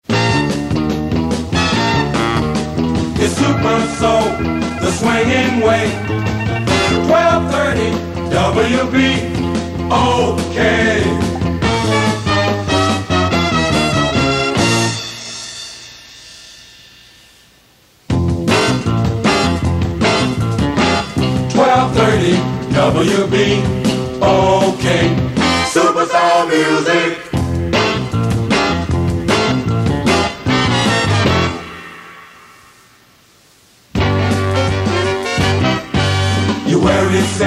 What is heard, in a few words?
jingles; Pepper Tanner; Memphis, Tennessee